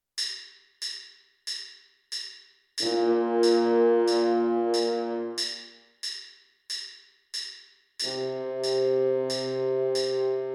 trombone

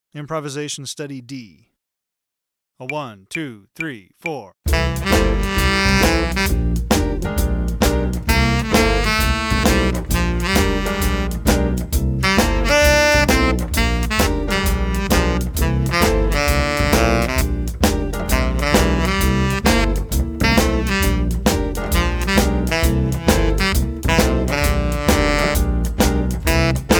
shuffle improvisation

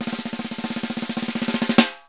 snare drum

